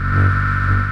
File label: tesla ball; Dystopia; weapons